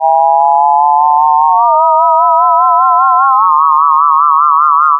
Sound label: freq And Amp Mod